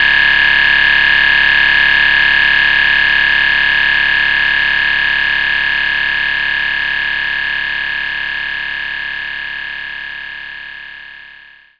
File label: guiro